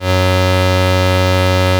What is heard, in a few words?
tuba loop note